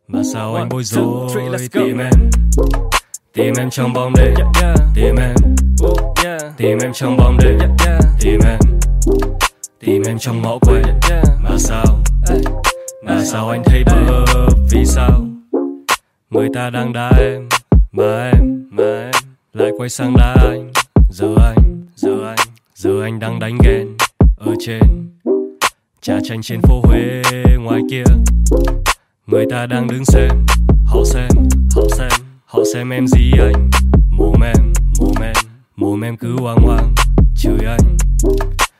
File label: rap